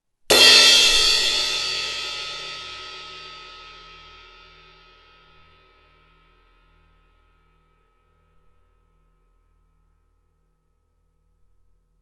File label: Projection Crash Cymbal